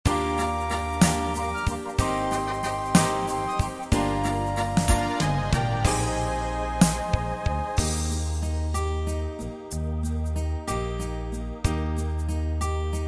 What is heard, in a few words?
orchestral